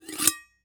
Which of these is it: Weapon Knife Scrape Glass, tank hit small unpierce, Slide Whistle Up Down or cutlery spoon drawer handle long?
Weapon Knife Scrape Glass